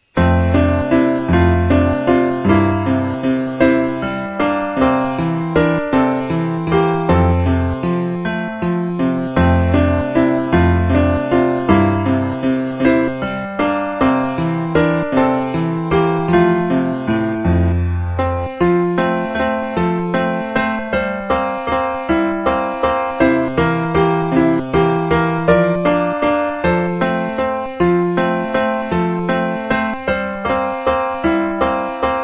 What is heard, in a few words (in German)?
Instrumental; Fuer Jesus will ich ein Sonnenstrahl sein